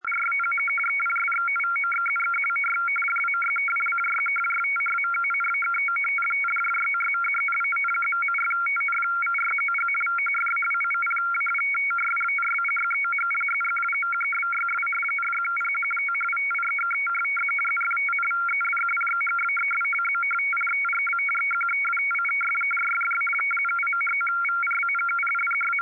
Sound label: FSK example